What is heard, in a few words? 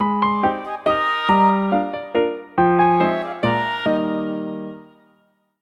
Soft Breeze Waltz; samsung touchwiz